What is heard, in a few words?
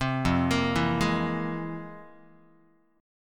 F Minor Major 11th Guitar, chord; arpeggiated; JGuitar